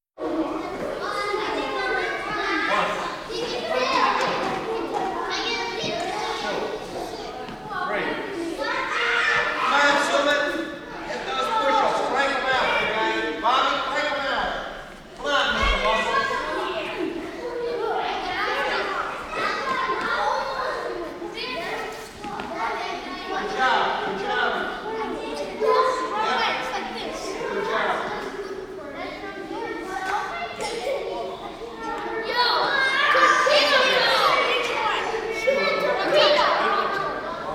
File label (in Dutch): Gymnastiek; KMP Film Video Sound Effects